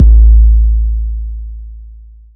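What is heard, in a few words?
909 BD long, kick drum; Goldbaby Tape808 & Tape909; The Tape 909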